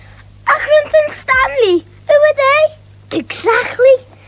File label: jingle; stanley who are they